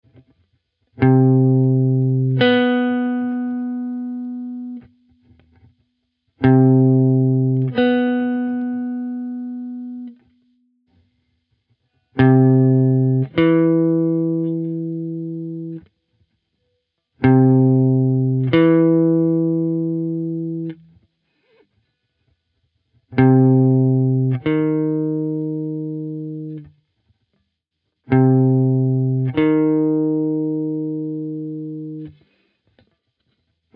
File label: maj and min 3rds and 7ths